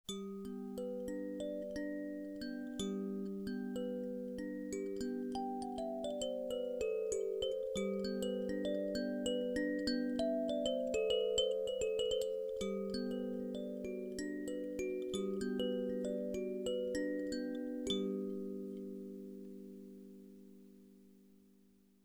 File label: 13 tone pentatonic, kalimba; 11 tone kalimba